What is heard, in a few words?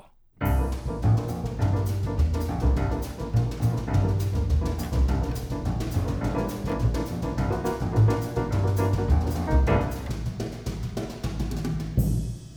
Jazz Group Without Compression; The Best of Chesky Classics; Jazz and Audiophile Test Disk, Vol 3